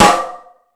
Fusion Stix, Drum Machines

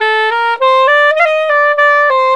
SAX, Balkanish Traditions

